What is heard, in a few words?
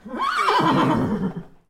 Horse neigh indoor